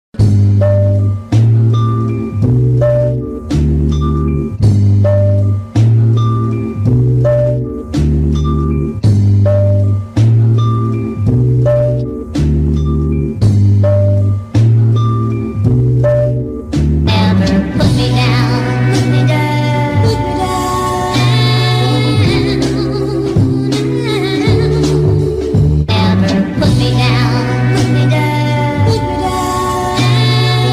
INST, 54BPM G MAJ

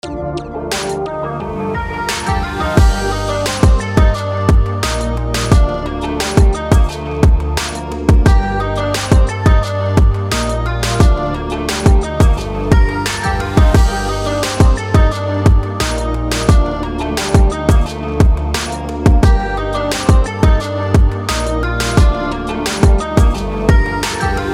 BED HALLOWEEN G#MIN 175BPM

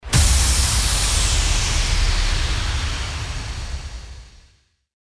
IMPACT

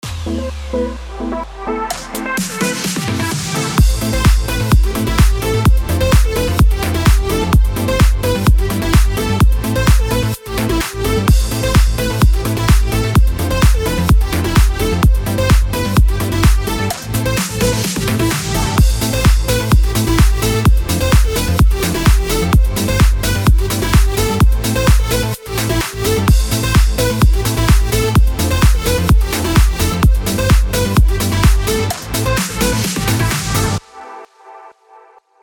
BED TRANCE AMIN 128BPM

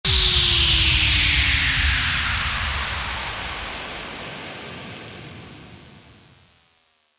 WHOOSH

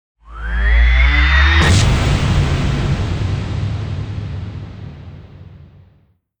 RISING IMPACT

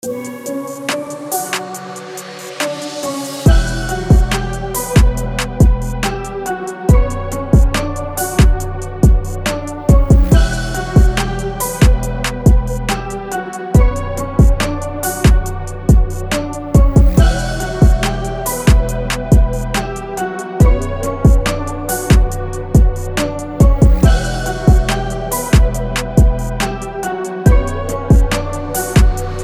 HALLOWEEN E MIN 140BPM

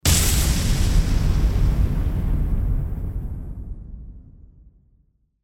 IMPACT

